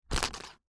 leisure game spinner plastic on card push spin short